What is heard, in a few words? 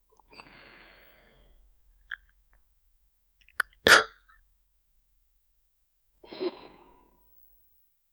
eating